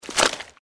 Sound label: giant rat jump; npc